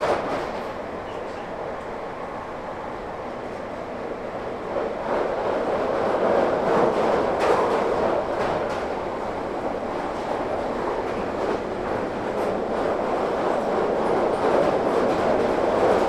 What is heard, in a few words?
transportation london underground train ride between stations